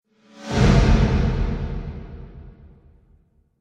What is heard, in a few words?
Trailer Hit Swoosh; Miscellaneous Impact Sound Effects